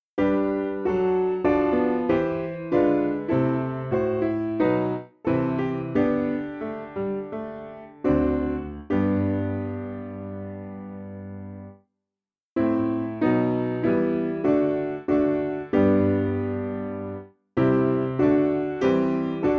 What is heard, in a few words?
My Spirit Longs, Piano